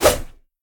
sword swoosh; Smite; Susano

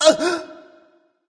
surprised; smite; hunbatz

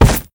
pirate run pegleg; smite; sobek

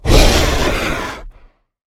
roar; smite; god sobek

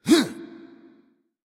grunt; smite; he bo

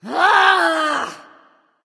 "ugh"; smite; nike

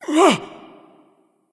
surprise; smite; ares

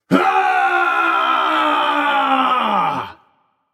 battlecry; smite; voicepack; merlin

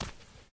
step; Smite; Xbalanque

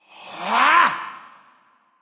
grunt attack; smite; hebo

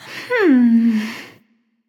thinking; discordia

